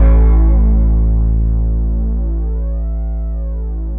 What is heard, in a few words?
Low Analog Stack (single note), Keyboards